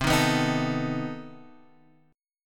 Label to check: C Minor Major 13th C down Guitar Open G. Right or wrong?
Right